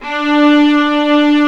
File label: STR, Vlas Sect, Agitato